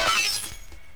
foxtrot, Zaps and Beeps

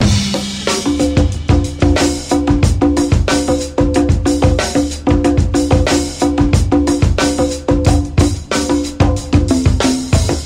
heavy bongoids, drum loops

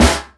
snare, Real

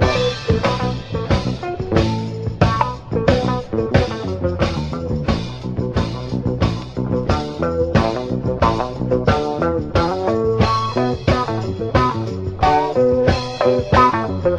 full, Loops Phonky

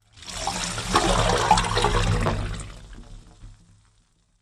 slime

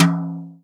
Toms; Real

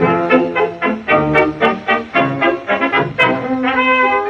horns, loops, phonky

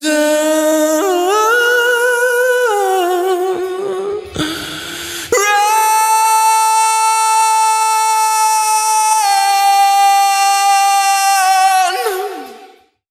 voice, Sad

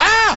"ah"

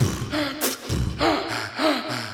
grasshopper, Beatbox, Drum loops

